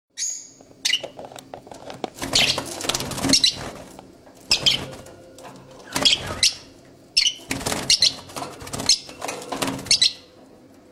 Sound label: Agapornis Pullaria